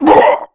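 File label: gladiator damage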